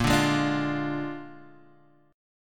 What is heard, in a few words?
Bb 7th Bb down, chord; strummed; JGuitar